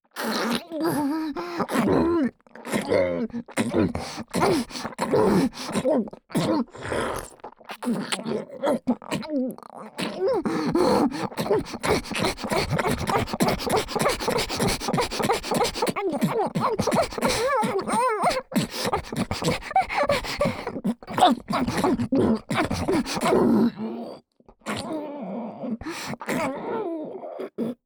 ツンデレ 喉奥左耳フェラ; フェラ素材

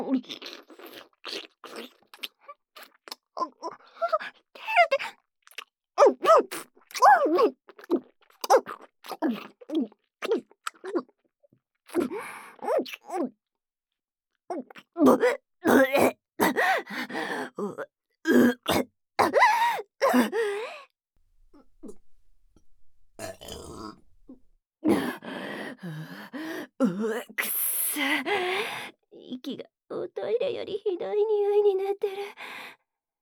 ゲップシーンまとめ

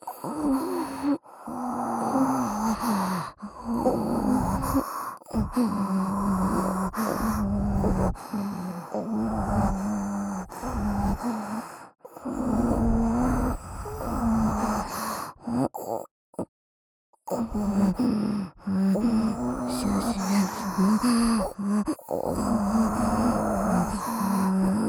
両耳元オホ喘ぎループ; 耳元オホ声、耳舐めループ; オナサポ

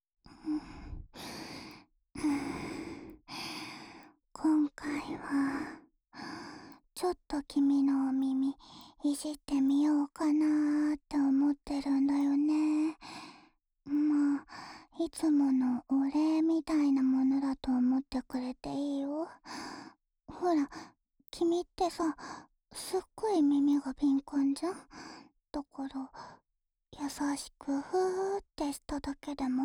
安眠用お耳ふーふー（右耳）, ASMR

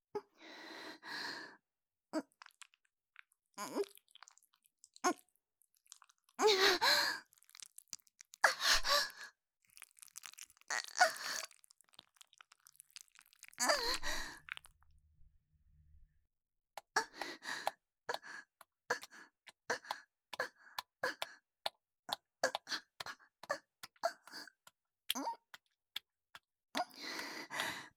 挿入 喘ぎ; SEあり

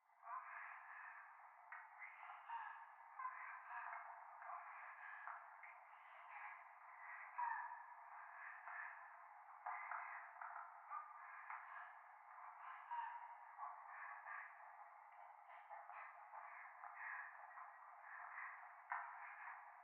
教会 右耳密着処女喘ぎ 電車

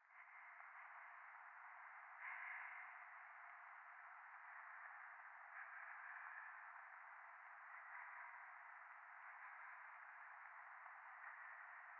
ゴースト 左耳快感吐息 雨

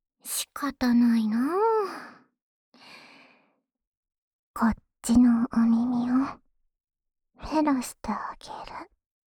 ツンデレ ねっとり左耳フェラ, ASMR

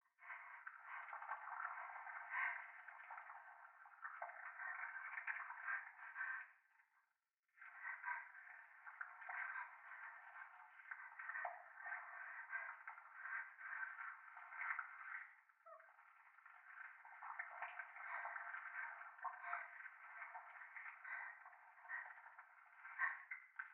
トイレ 左耳 快感 吐息 触手

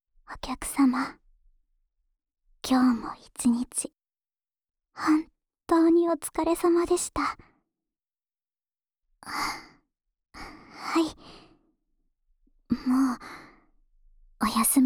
添い寝／左, ASMR; ASMR版

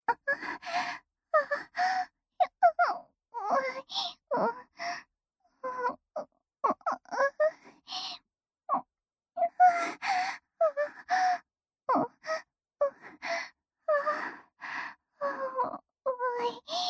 喘ぎ小; BGV

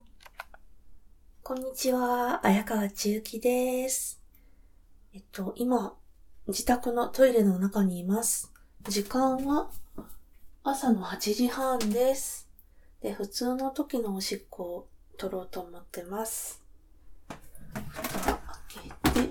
おしっこ実演 トイレでおしっこ編

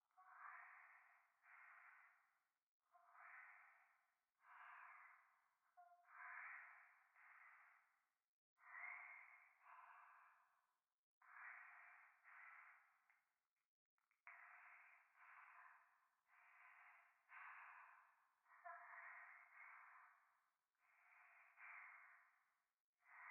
教会 右耳密着吐息

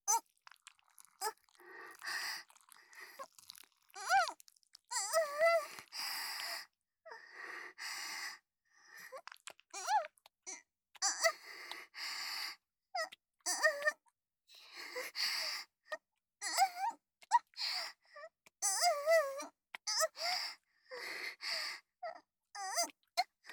挿入 喘ぎ; SEあり

